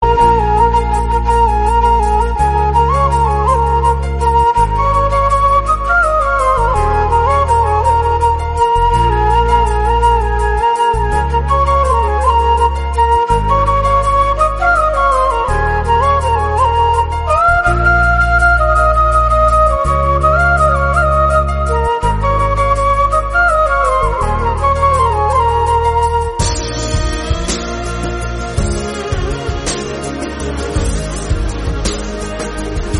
Flute; Ala Vaikuntapuramlo

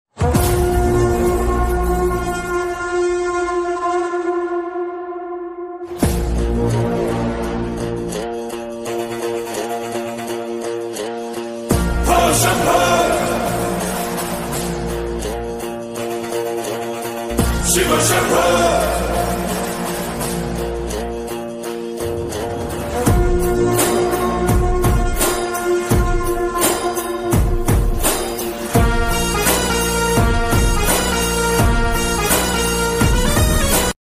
akhanda movie songs and ringtone bgm

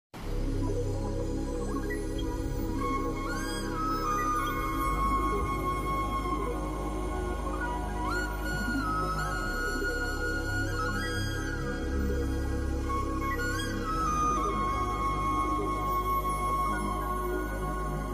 lovely ringtone; macherla niyojakavargam

